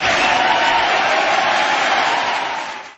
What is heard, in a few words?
Applause; WoWInterface; WeakAuras